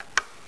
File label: light switch